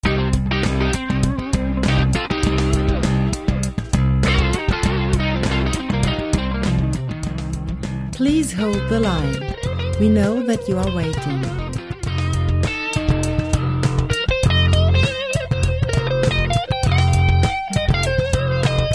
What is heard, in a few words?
jingle